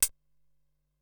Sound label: Synth Closed Hat, Drum Machines Ensoniq SQ-R-Plus; 300 Drum Machines